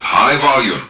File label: Vocal Phrases; Vengeance Samples; Vocals